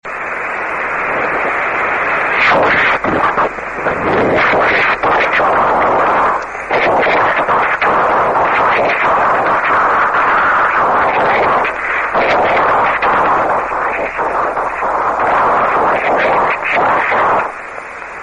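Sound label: Aurora propagation on 144 MHz - SSB; VHF; OZ8SL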